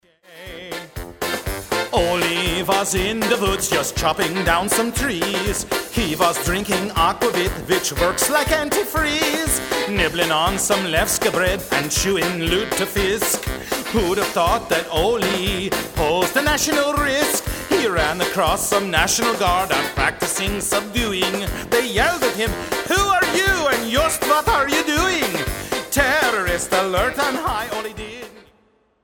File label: uff dah polka